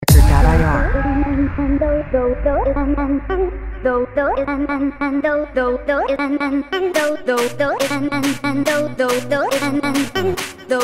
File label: Vocal Chopping